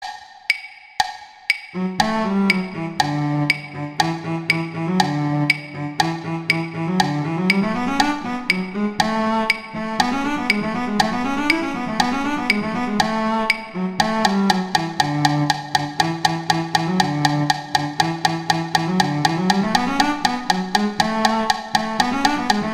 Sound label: Cello II; Duets; Mozart